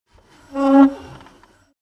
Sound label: Wooden ship rudder turn creak; vehicle